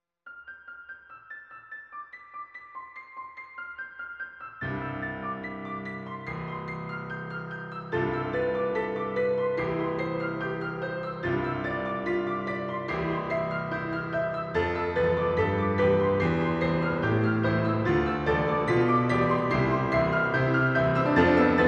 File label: Carillon; Rimsky Korsakov